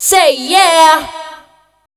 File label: PHRASE, Voice Spectral; Best Service; Voice Spectral II